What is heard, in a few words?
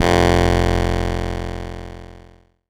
bass F1, Korg MS-20